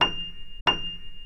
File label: F7 forte, piano sound